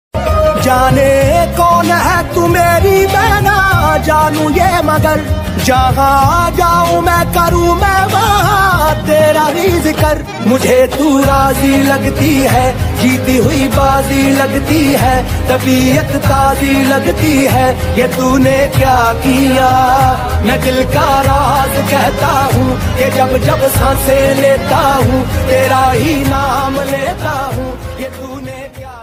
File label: Hindi Song